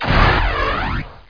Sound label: muscle; tnpdemo